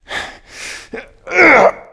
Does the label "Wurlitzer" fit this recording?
No